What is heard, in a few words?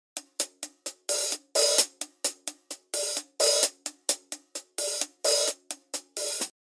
drum HH hard